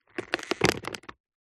impacts card box contaning fragile goods squash break crack